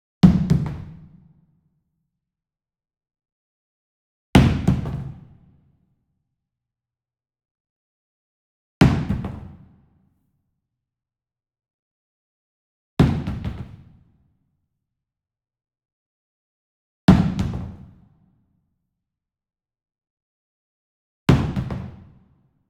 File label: Sweetener Wood Mallet Bounce Hit Medium; boom library; Cinematic Strikes Construction Kit